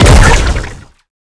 deadlock barrier active

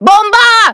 bomb throw; raze

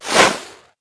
throw barrier; deadlock

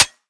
Reload, weapons; frenzy

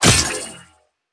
weapon charged; cstrike; Valorant

